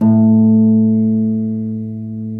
Harp A1; Digital Sound Factory Virtuoso

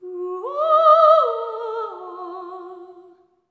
SERENE, Symphony of Voices; Spectrasonics

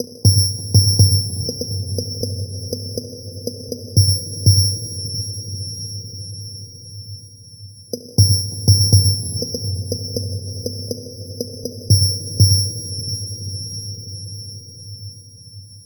Dark Beat, Dark Organic Processing

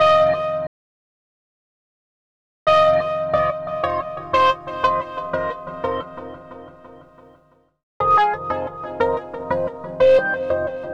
Phat Rez, Guitars; Zero-G Smoke

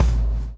BLOW; Spectrasonics - Backbeat

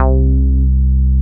SNGL.BASS, Jungle Rave

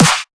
drum snare beauty snare; Virtual Riot; Drum Hits

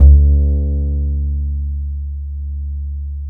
D2, DOUBLBASS, Balkanish Traditions; USB Soundscan vol.63; AKAI

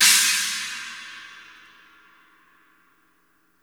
China, Live Kit; Sonic Foundry (Sony Creative Software); Ugly Remnants VOL-2; Single Shots; Beatstuff

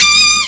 lead; Amiga Soundtracker Sample Packs

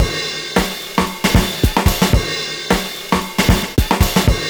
jazzy sound; Univers Sons

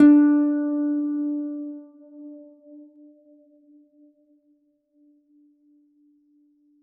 D3, harp; Garritan; GigaHarp

